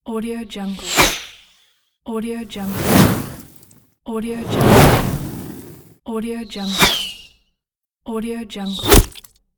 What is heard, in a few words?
arrow